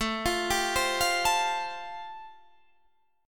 A-Minor 7th Sharp 5th, Guitar Half Step Down, chord